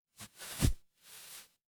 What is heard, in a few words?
paper fabric fabric